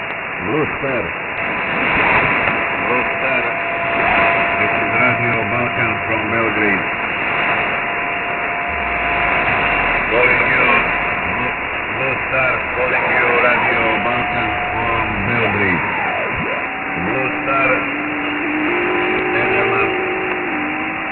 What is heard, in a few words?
Balkan 1700 kHz; Radio Balkan; Beograd, Serbia